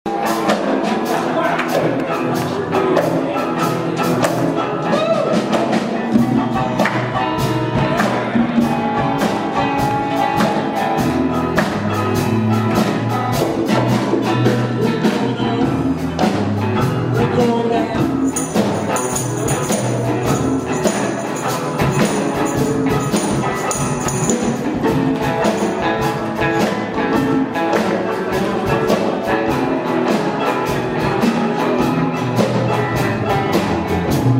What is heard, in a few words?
E Blues Medley